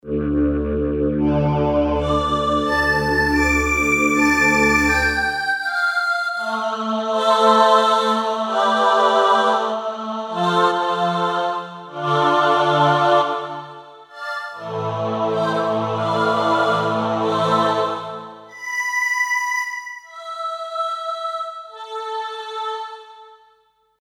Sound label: choir; emu proteus; choir: simple; Motorola MC68000 manages up to 32 channels polyphony